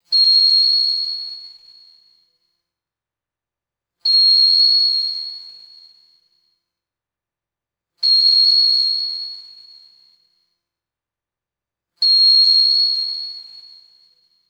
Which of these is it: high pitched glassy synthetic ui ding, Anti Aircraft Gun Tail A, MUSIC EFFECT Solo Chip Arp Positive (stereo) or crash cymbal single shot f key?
high pitched glassy synthetic ui ding